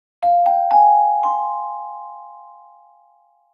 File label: Marimba